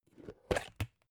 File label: plastic bowl open hard